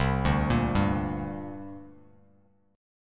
C 7th Suspended 2nd, C, down, Bass Standard; C7sus2 arpeggiated; JGuitar

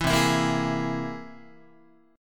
C Augmented 7th, Guitar; strummed; JGuitar

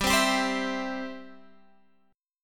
G 6th Suspended 4th; G6sus4 strummed

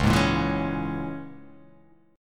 Db Minor 6th, Dbm6 chord; Dbm6 strummed; x,4,6,3,4,3,3,x; JGuitar

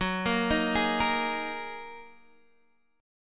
Gb 6th Add 9th Gb down Guitar Standard; arpeggiated; Gb6add9; JGuitar

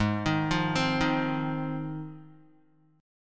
G sharp 6th G sharp down Guitar, chord

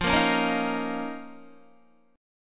F 7th Suspended 4th Sharp 5th F down Guitar

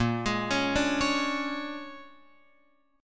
A sharp 7th Sharp 9th, Guitar, Open F; arpeggiated; chordshape; x,5,8,8,5,8

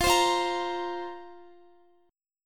F Suspended 4th F, Guitar; Fsus4; strummed; x,x,15,17,17,17

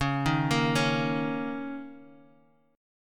Bb Minor Double Flat 5th, chord

